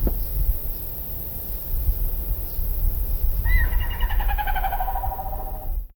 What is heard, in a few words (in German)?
aufschreckende tauben die, flapping